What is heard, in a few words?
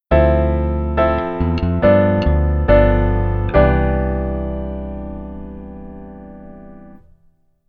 Keyboard Voicing Rootless